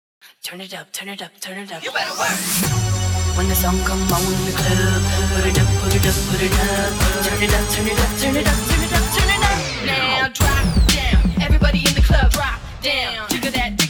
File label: Tryout Music